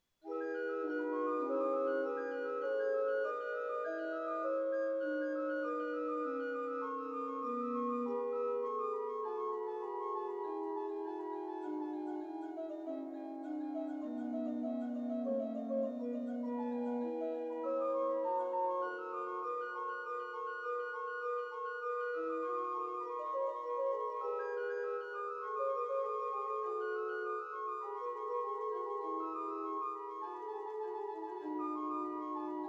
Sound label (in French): orgue, Post Communion